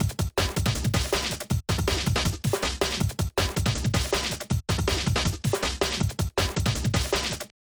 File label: loop